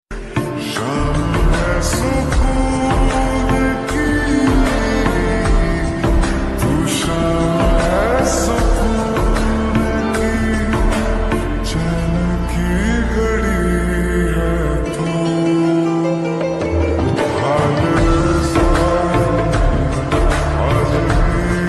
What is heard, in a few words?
Hindi Ringtone